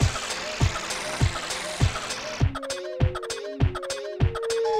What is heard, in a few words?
Cartoon